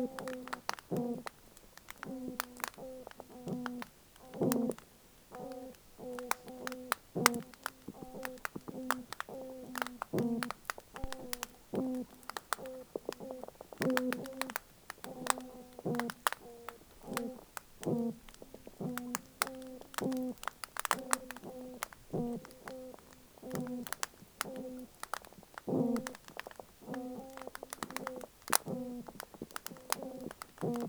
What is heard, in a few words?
Toadfish Example